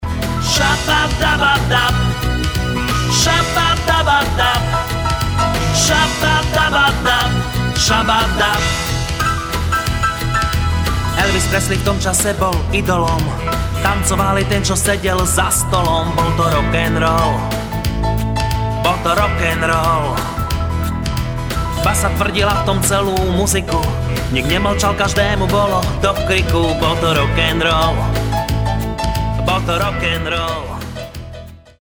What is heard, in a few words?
Rock n roll